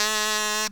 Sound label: Kazoo ti